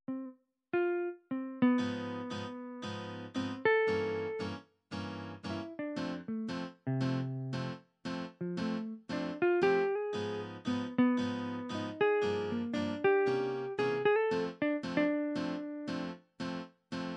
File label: jazz, midi